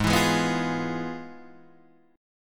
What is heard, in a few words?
F Minor Major 7th Flat 5th, Ab